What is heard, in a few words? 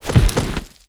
grunt impact medium